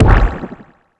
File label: Stomp landing; Super Mombo Quest